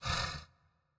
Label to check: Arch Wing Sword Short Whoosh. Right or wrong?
Wrong